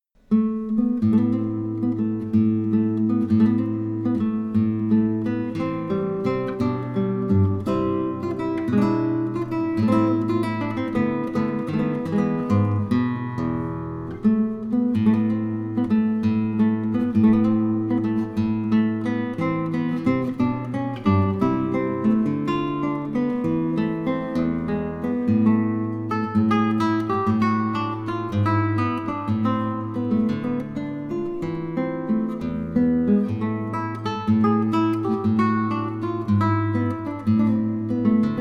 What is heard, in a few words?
La mariposa, guitar; Giuliani M; Opus30 n31